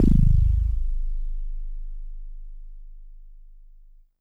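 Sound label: Mono Bass DX100 G#4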